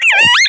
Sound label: Blip